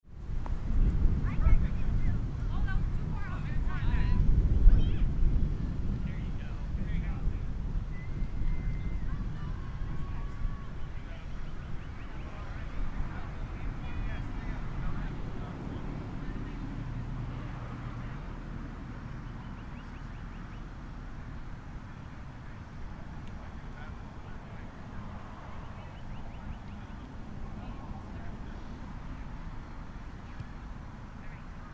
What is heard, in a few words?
Suburban Park